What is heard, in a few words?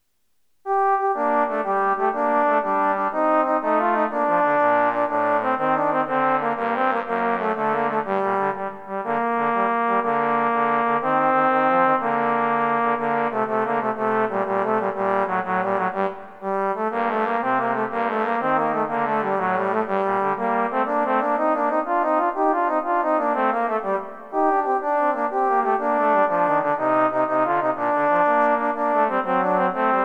arantella Two Bones